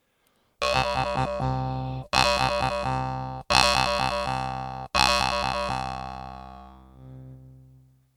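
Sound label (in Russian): арфа продолжительный звук с вибрацией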